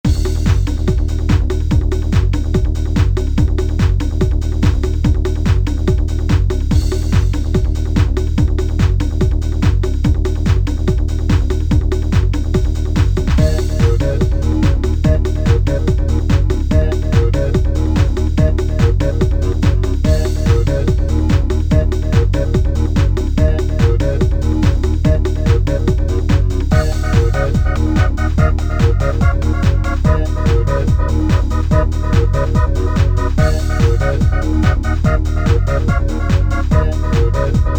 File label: Space Station; Extreme-G